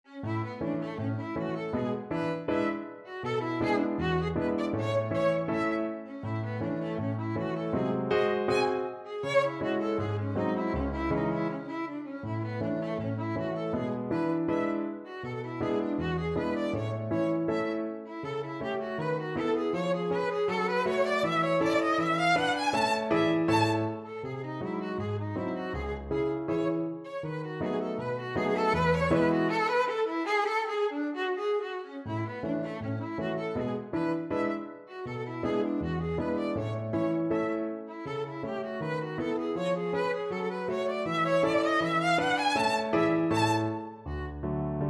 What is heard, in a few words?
pizzicati, violin